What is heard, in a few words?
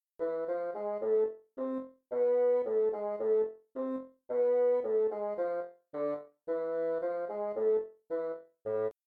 Harmonic, scales Minor